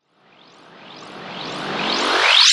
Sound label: Sci-fi Approach